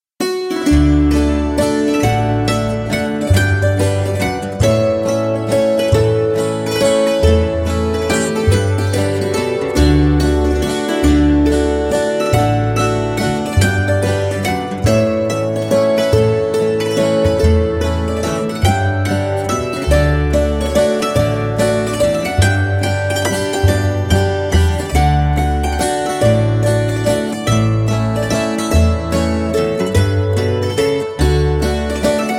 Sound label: Waltz; Jenny Lind Waltz; Tombigbee Waltz